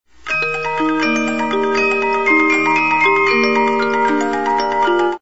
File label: 50-Note Movements, music box; The Sleeping Beauty